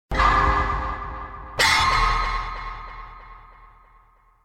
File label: skill check great; dbd